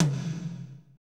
tom, Power Ballad; Northstar; Drumscapes Roland

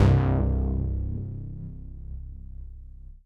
Synth Prophet Bass C; Electronisounds

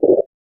Drums; Distored Reality

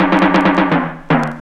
fill; AMG; Now CD-ROM (Roland)

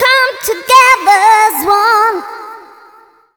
FEMALE, Dance Vocals

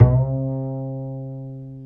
Bass, Roland

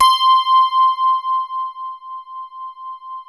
E RHODES; Best Service Advanced Media Trax Modern Composer

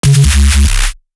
reese, bass

